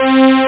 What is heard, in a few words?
synth, chords